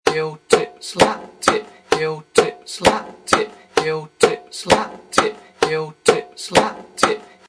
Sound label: heel tip basic slow, conga